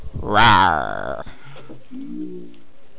rawwrr

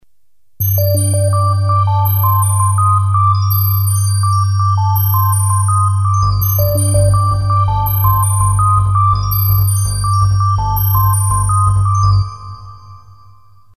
mystic tune

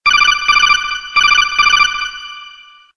codec ringtone, alert sound; metal gear solid

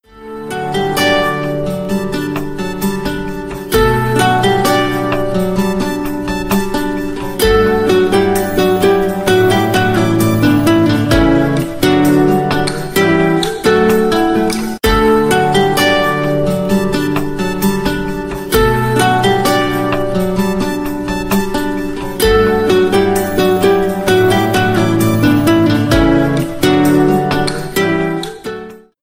huawei tune whistle ringtone; huawei klingelton

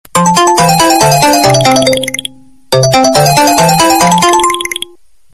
samsung sms sound; samsung standard sms toene

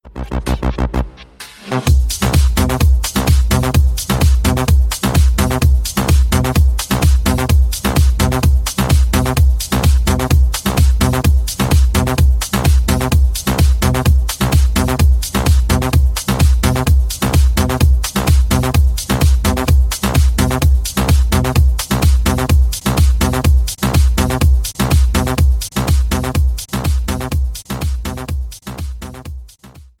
tech house ringtone

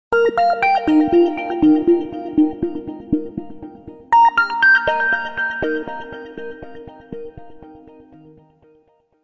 alarm

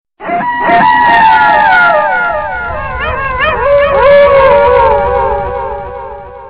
wolf howling; horrorsounds

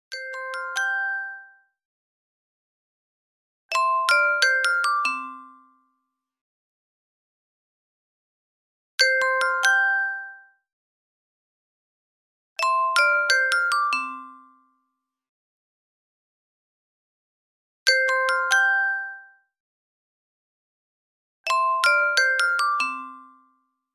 chime melody

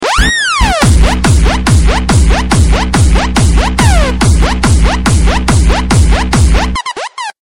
power bass sms

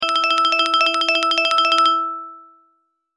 hurry bells

